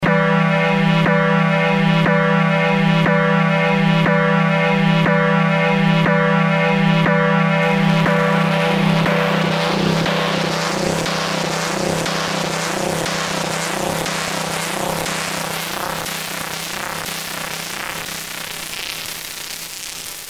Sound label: Pulse width effect